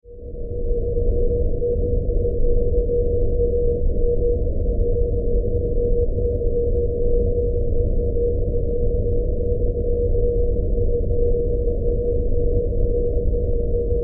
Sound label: Warfare Submarine Interior; Machine Gun Sound Effects; Machine Guns (Sound Effects:Guns); SFX Bible 6